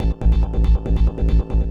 Industrial 140

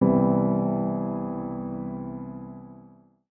C Keys, One Shots; Keys & Synths; Touch Loops; BlissedOutBeats

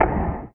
One Shot, Hits

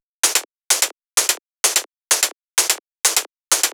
drum loop 128 Top; Electro; drum loops; Anthemic

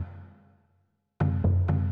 PERCLOOP ETHNO 125

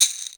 SHAKER

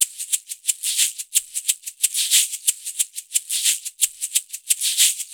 ETHNO PERCUSSION 90; ethno2

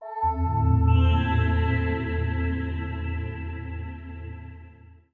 TECHNO PAD 140; Keys

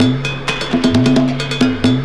METAL 133, loop, BPM; 122-146 BPM

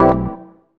dance chord

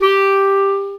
Alto Clarinet Portato G3; VarazdinOrchestral

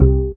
ORG, DANCE ORGAN

